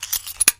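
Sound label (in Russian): звук когда достают скобы из степлера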